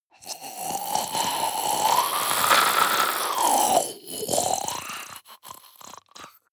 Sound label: zombie female breath throat; kreaturen